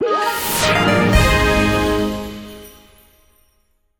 item badge get

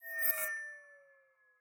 Erase

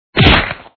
Barrier sound

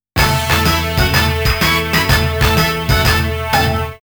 March; the king of fighters 98 ultimate match

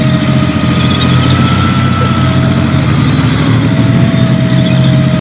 tank on high

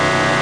FM Beam loop; knights try

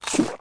Eat

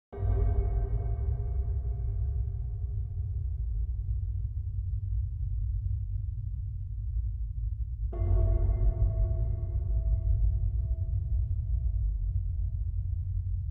Underground Ambience; Dark Sun Wake of the Ravager; ms dos

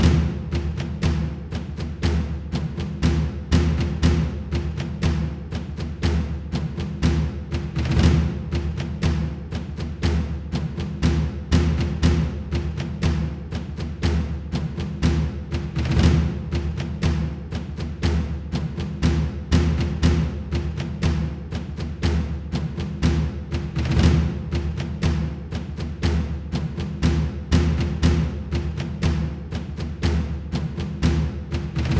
battle layer

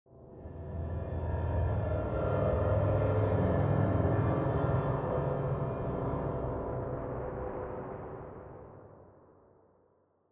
Trap Stinger; Bill Hoist Trap Stinger

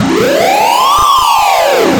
Alarm Arcade Fast Loop, Stereo; Super Jagger Bomb; PS4

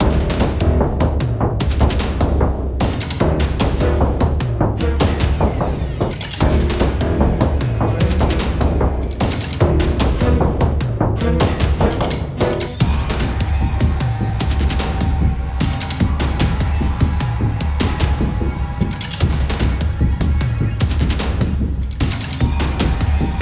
Old Town Appartment Attack; dying light gamerip 2015

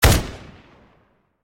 Akm Fire; Criminality

